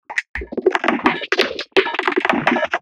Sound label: Loop, 70 bpm, Circuitbend; 99Sounds; Mangling Audio; Loops